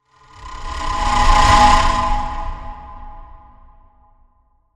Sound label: Bizarre Backlands Whoosh